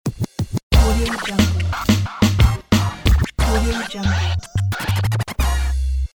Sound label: urban scratch logo